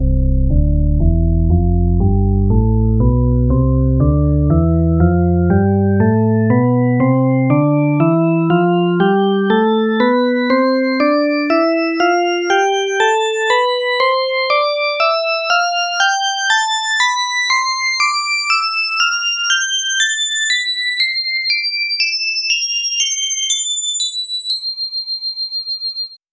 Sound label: drawbar organ tonleiter